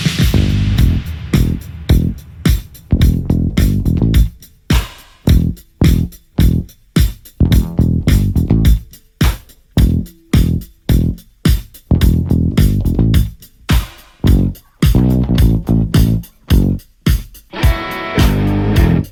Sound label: ringtone